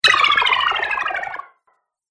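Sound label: Sci-fi Laser Slow Down; From B Movies To Blockbusters; Andrew Fleming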